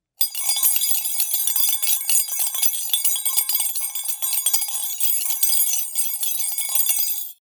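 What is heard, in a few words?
sound spoon in glass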